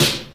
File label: rich top end snare drum sample f key; snare-drum-samples